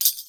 india hatheli

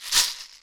box rattle; Kenya

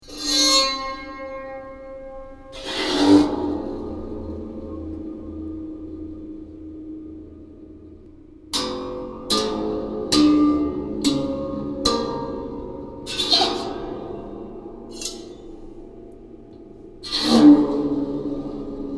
water harp

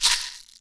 box rattle; Kenya

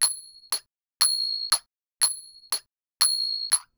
thai ching loop 120; Thailand

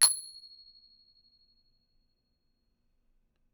thai ching open; Thailand

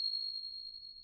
thai ching; Thailand

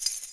sistrum; Kenya

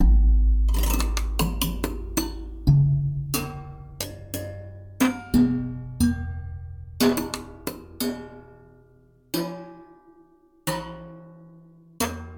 hammered kalimba

